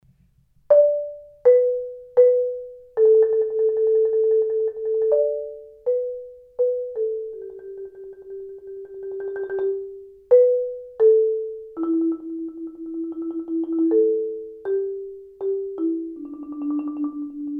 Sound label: mallets